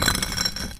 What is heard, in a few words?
cinder block move; SupaLidlGame